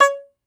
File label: Tenor Banjo, Plucked Strings